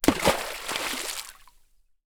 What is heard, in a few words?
Water, Splash, Rock, Throw In Lake